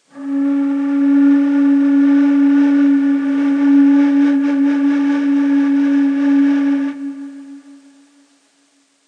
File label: Pes Buselik, Neysample